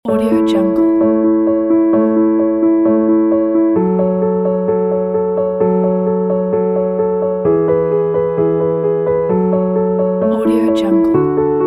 inspired piano

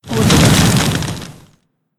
fireball

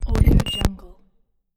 glitch; bogdansfx

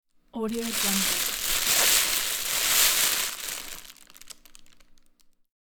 unpack plastic bag

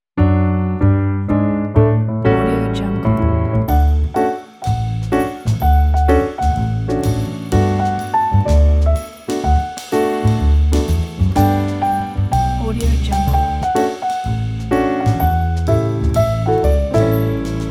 christmas jazz trio jingle bells

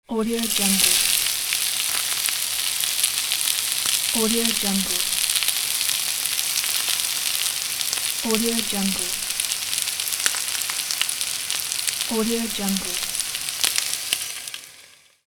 sizzle; stockgold

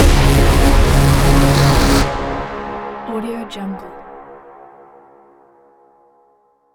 braaam strike; esmteam

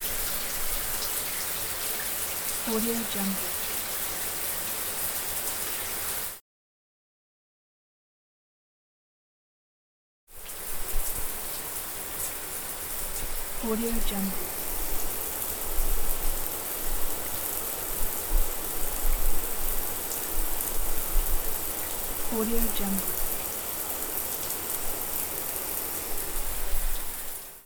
shower

